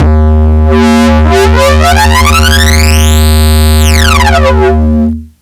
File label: minimoog, techno acid